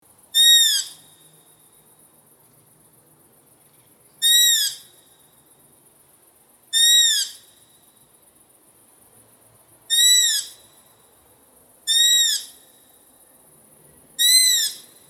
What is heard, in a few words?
Long eared Owl